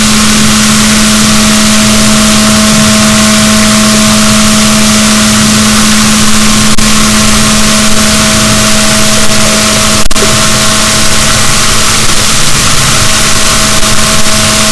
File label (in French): rorquals positif, BalaenopteraPhysalus, boat